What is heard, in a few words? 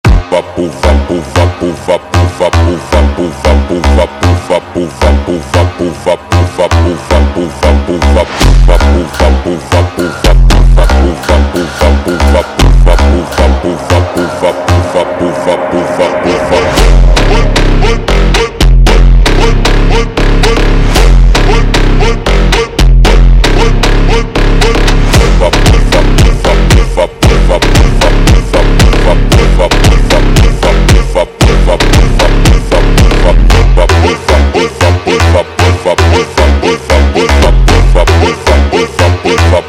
Phonk slowed